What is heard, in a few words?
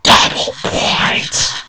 double points; nzp